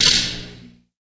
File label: Foul, Pool